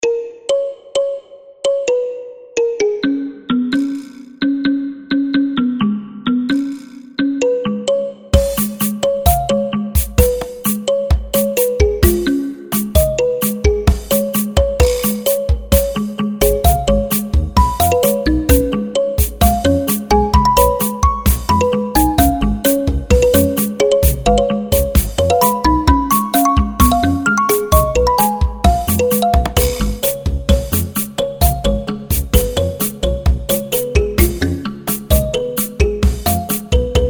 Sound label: Balafon Soul; Tsetse Music; tropical heartbeat